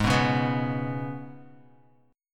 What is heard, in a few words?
Ab Minor Double Flat 5th down Guitar; Abmbb5; strummed